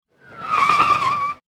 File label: sliding, sliding tires; sliding car sound; teststrecke-game